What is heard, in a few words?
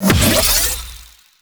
Fairy Dust Energy Restore Augment; Warframe; Augments